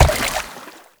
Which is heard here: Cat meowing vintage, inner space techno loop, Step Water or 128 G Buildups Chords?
Step Water